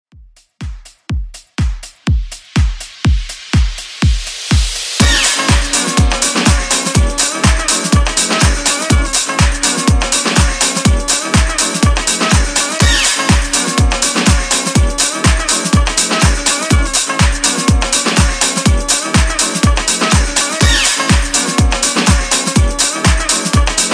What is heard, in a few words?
Electronic House, 123 Bpm